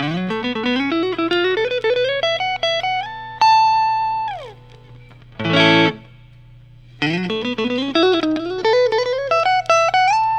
lentz traditional fiesta; pre lawsuit s

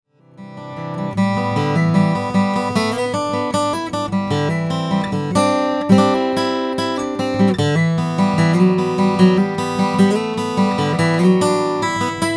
hewett gc striped ebony

